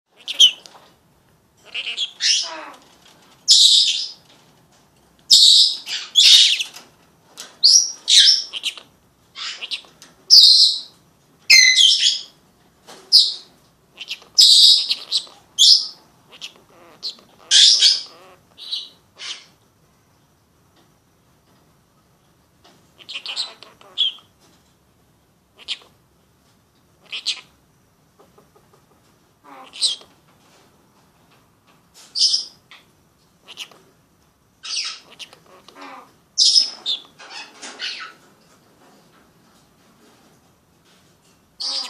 senegalskij popugaj govorit; poicephalus senegalus

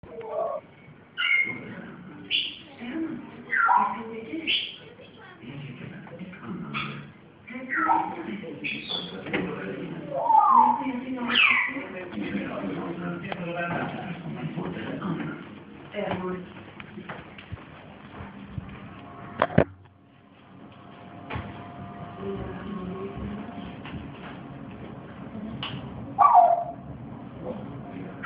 zhako popugay pervye zvuki; psittacus erithacus

